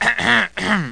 cough; Wired for Sound Pro CD; Aristosoft, Inc.; jobsaver

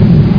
Zoom; PC Gamer IT CD 35

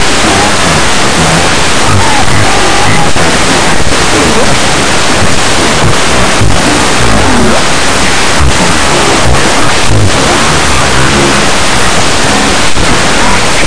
club

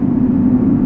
wind canyon

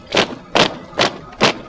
Barracks LOOP; PC Gamer IT CD 46; CT DEMO; Ambient

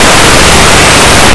cardbd

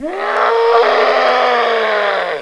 scream; thexder

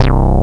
BASS; Descent

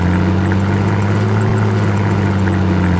whl lp; PC Gamer IT CD 32

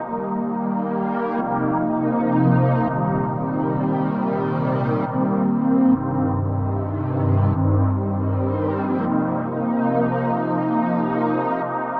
SYNTH PAD AMBNT 160 C, Ambient; eJay; Sphere

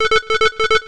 ALARM

